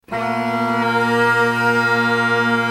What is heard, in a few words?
cornemuse bagpipe